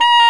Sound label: SAX XSAX, Saxophones; All Saxes